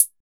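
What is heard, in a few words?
NORD CH, closed hat; Drums In Your FACE!; BONUS HATS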